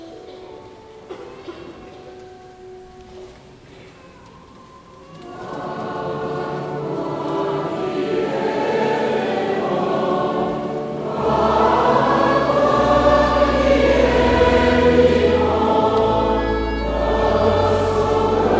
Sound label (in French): Tutti; Anamnese saint Boniface